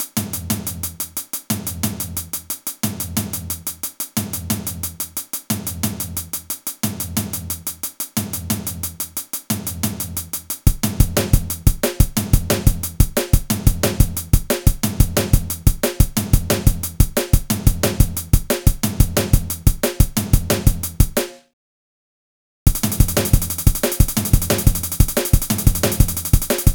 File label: free drum; These are some experiments I made using GNU/Linux to produce simple drum and bass tracks